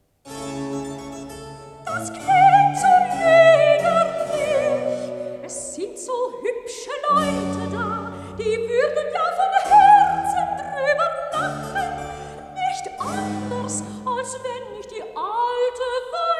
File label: recitativo soprano; johann sebastian bach; bauern kantate bwv 212